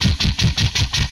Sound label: Helicopter Loop, retro arcade; MMRetroArcadeSoundsPack; Vehicles